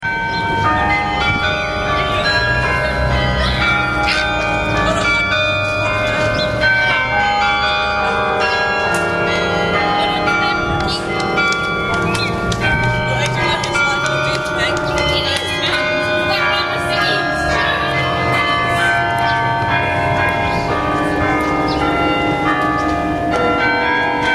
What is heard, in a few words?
Carillon sounds